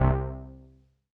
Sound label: 505, String Machines